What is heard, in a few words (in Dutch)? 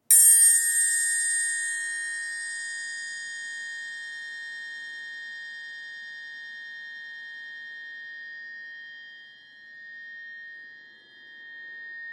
Pentangel Middel brons Gehamerd